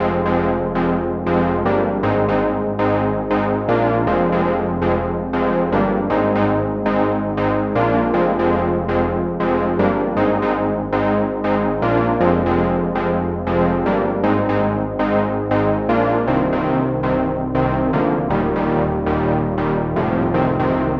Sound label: Anthemic Brass 2 wet